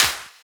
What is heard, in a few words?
Clap, Psytrance